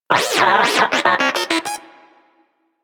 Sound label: Moa Inquisitive Vocal Enemy Killed; Warframe